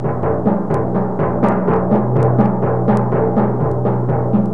musical

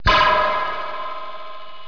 scifi

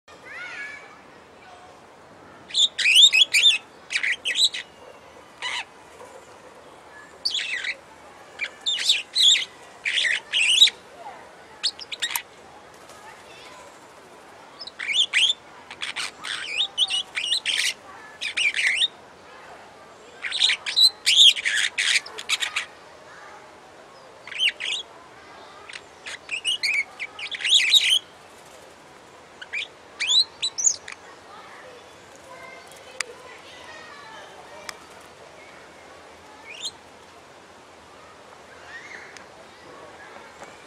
superb starling

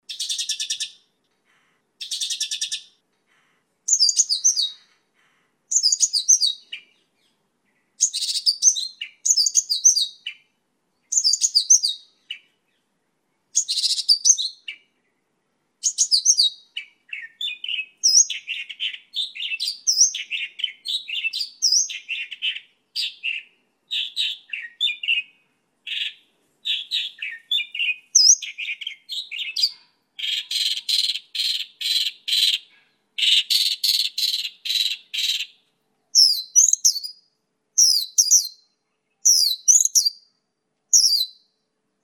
mongolian lark

